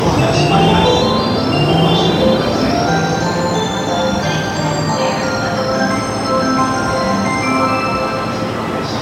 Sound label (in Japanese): JR京浜東北線有楽町南, train sounds